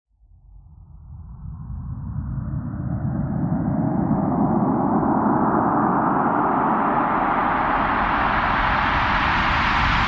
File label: Rise